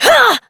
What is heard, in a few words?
Exert Adult, Melee Swing; New War; Operator